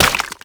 Run Sludge; Footsteps; Warframe; Tenno

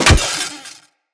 Melee Staff Impact Robotic Flesh

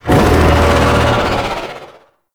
Void Dragon Vocal Pain; Enemies; Vocals; Warframe; Duviri

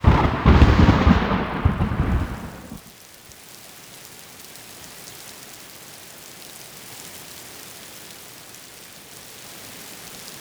Rain; Narmer Outpost

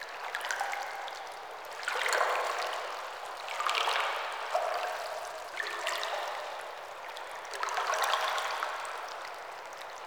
Cave Water lap Loop; Eidolon Plains; Caves

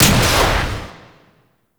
Missile Shot; Warframe; Veilbreaker; Venkra

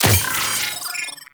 Drone Death Malfunction; Warframe; Venus; Venus Terra Attack Drone

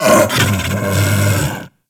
Kubrow Tame Sleep Idle Snore Fidget B; Warframe

